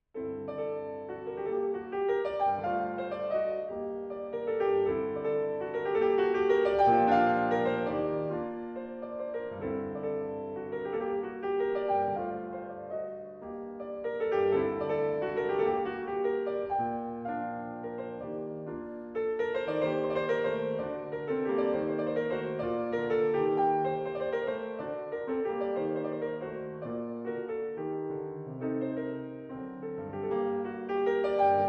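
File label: Mazurka G minor; Vitols; Mazurka un valsis; Mazurka and Valse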